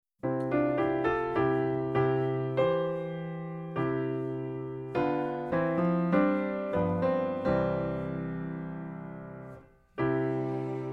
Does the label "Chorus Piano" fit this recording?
Yes